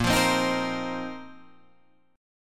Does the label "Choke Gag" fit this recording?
No